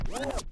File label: back spin short, vinyl record